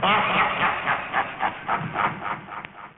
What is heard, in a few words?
Evil laugh; PowerPong 1.0.1